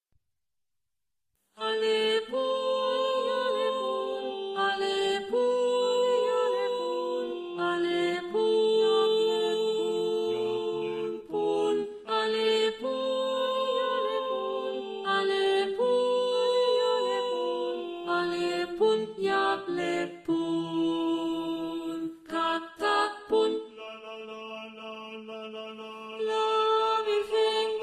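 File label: soprano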